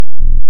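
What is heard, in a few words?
waveform FM triangle triangle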